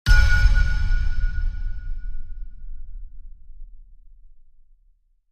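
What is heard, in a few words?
Impact Metallic Chime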